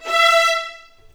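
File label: E5 violins; The Ultimate String Collection; East West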